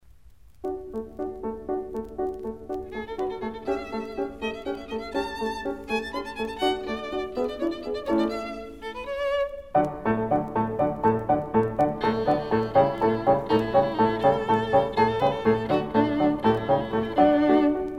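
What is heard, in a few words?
violin sonata; weber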